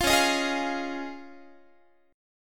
C sharp Major Add 9th, Guitar; C#add9; strummed

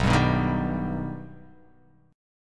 B Augmented B, chord

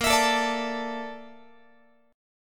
A sharp Major Sharp 11th, Guitar; strummed

